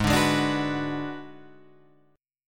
G sharp Minor 7th Flat 5th G sharp down Guitar Half Step Down